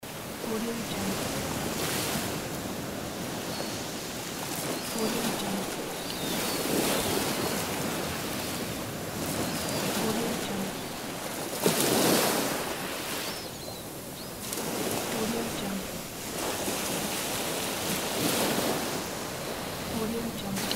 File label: sea